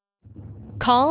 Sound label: End Call